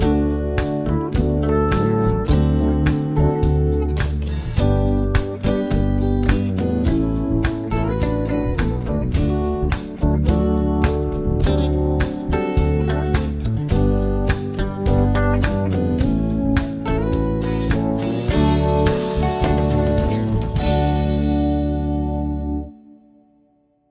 progs with applied chords

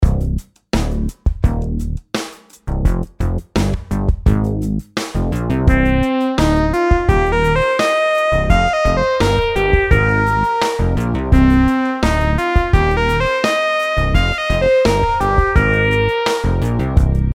rhythm simple 8s triplets

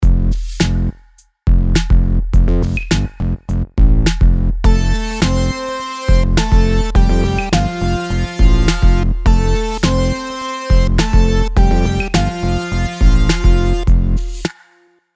melodies simple 4s Fmaj; melody

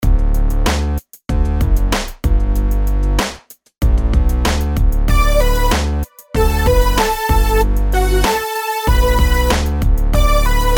rhythms simple 8s major

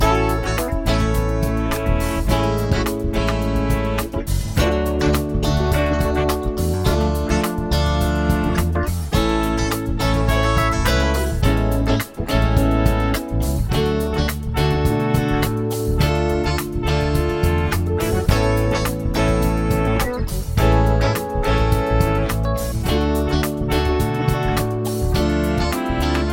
chord prog with mixture; harmony; root

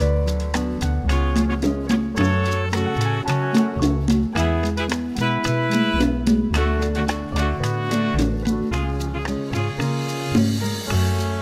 minor key chord triples, F sharp

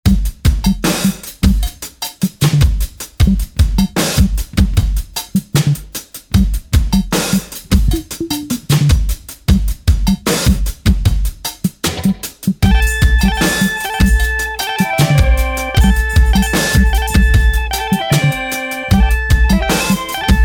4-4 half-time; rhythm; meter id

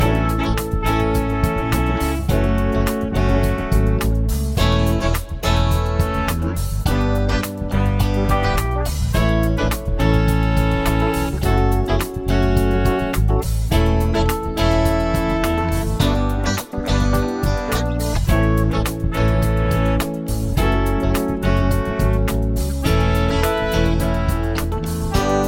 chord prog with mixture

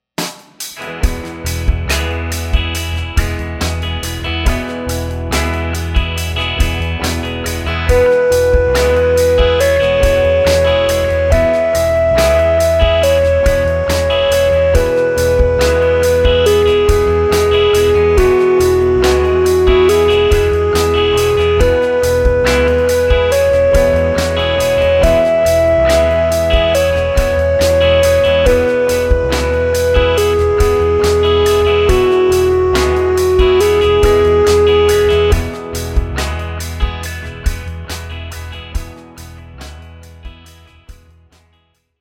scale degree

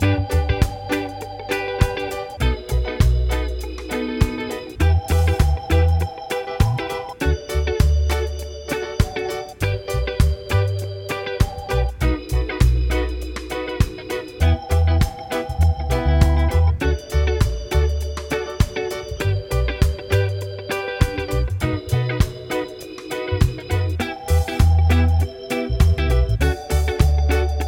progs with applied chords

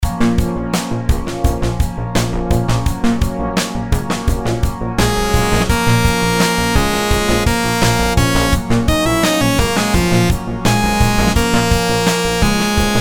rhythms simple dotted 8s 85

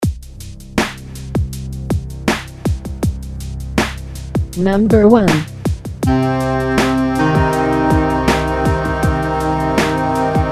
intervals min 8 up

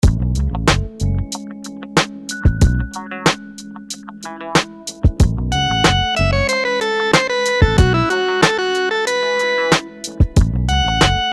rhythms dotted 8s

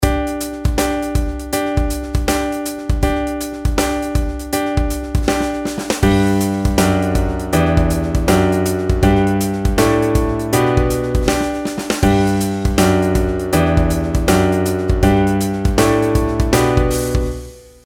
pitch patterns stepwise; melody

